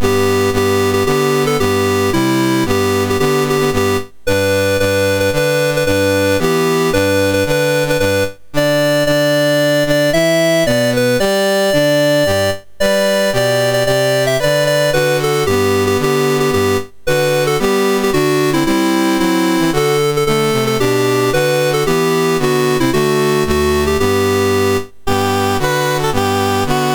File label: Mali, National Anthems, MOS6581R3; Dave Marquis